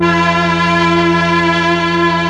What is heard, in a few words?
STR, STRING